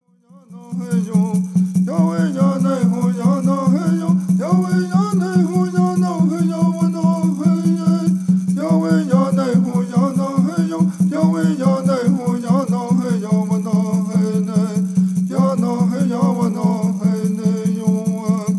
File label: Straight Song